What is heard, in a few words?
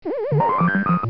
Barrel Jump; arcade; DK